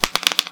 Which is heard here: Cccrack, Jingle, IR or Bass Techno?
Cccrack